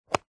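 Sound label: leisure remote control car remote switch click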